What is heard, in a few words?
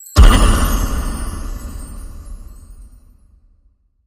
Unicorn Magic Hit